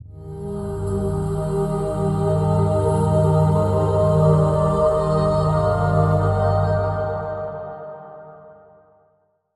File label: Link Sting